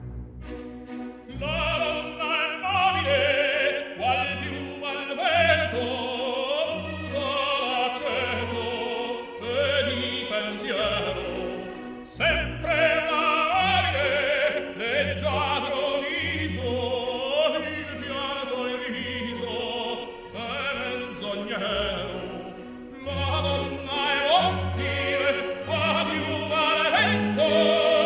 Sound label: opera; de surreal